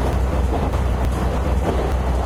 dc100 80i tunnel; tetsuon

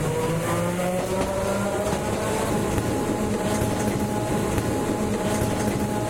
streetcar slow middle; tetsuon

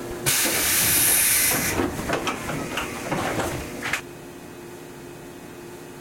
door auto; tetsuon

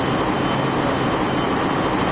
701 igbt mid; tetsuon

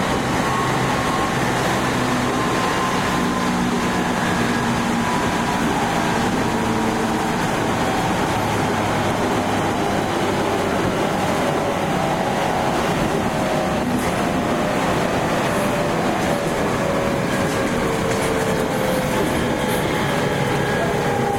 701 IGBT mid stop; tetsuon

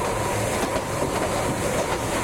streetcar top; tetsuon

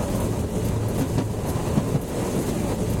streetcar slow; tetsuon

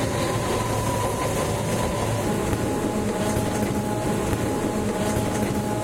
streetcar top middle; tetsuon

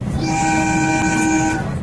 719 whistle; tetsuon

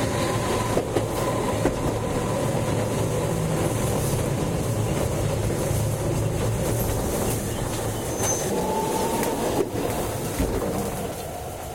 streetcar top stop; tetsuon

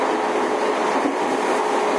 E233 top; tetsuon

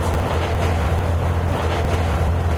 dc100 70a tunnel; tetsuon

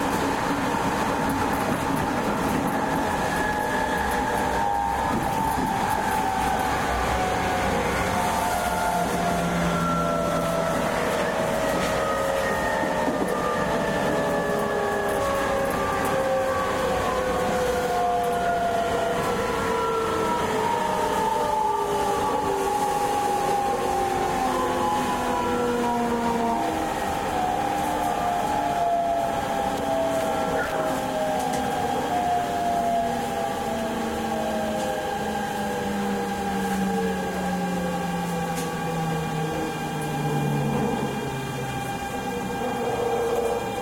E501 top stop; tetsuon

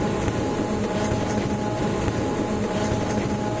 streetcar middle; tetsuon

